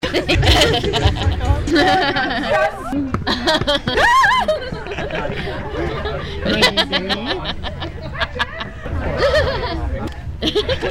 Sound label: laughs